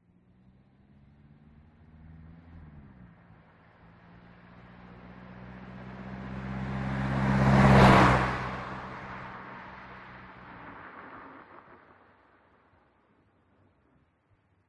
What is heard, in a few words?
Citroen C2 Pass By; PMSFX